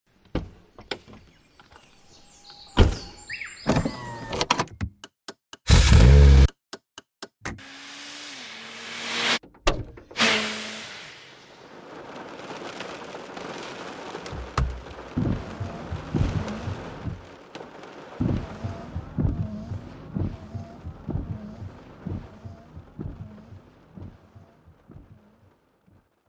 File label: volvo 740